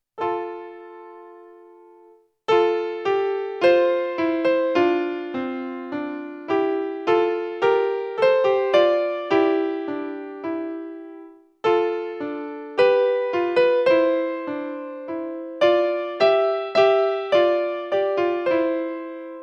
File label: canadian anthem accompaniment